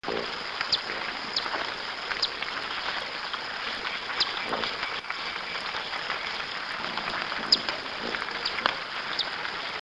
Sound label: Festive coquette